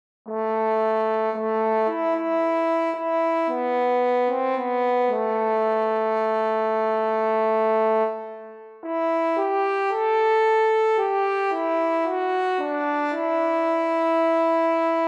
solo F Horn

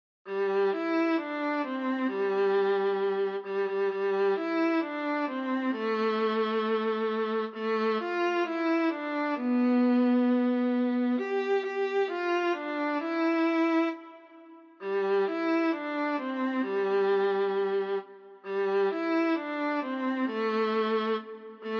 solo Jingle Bells

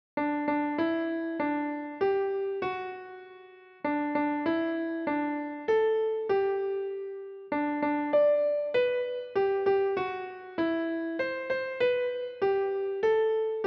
Happy Birthday to you Klavier

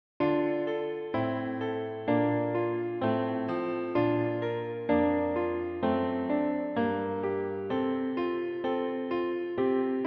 F Horn; O du fröhliche

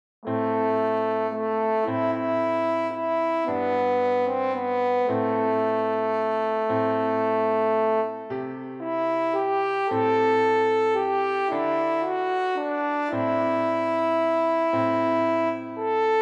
Scarborough Fair F Horn

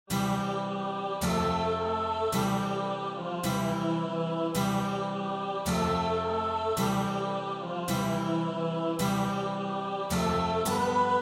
We Shall Overcome

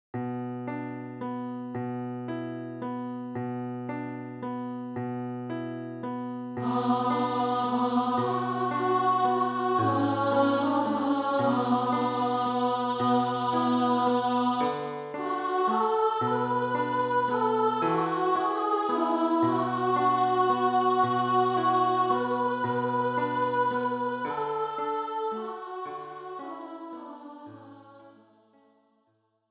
Scarborough Fair

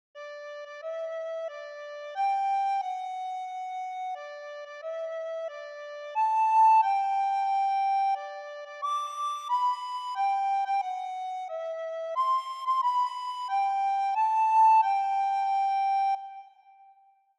Happy birthday to you, solo

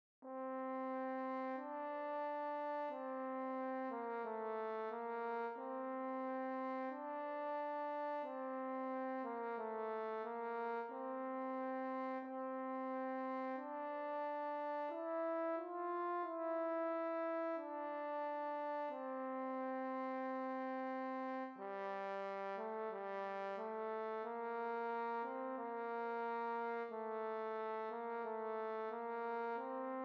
F Horn solo; O du fröhliche

